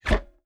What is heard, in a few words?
Door Safe Close